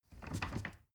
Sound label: ladder wood rattling